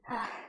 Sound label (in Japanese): 吐息; ポーズリクエストに応える回